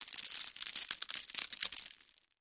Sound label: Landscape Rock On Con, rocks & dirt